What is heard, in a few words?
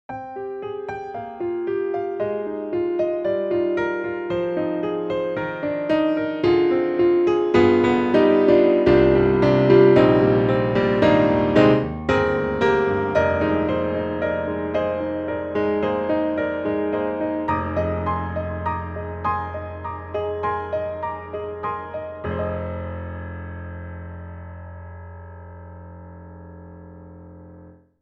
Grand Pianos German D Intimate Club; Synthogy; IvoryII